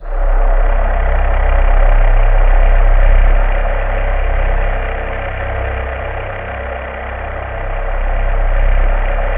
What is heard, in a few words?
C1, Synth Leads